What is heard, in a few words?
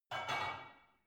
barbell only replace reverberant soft; sport freizeit; fitnessstudio